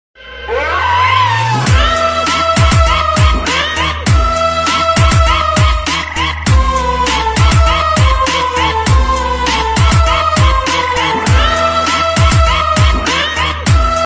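horror ringtone ghost ringtone very dangerous scary ringtone background music horror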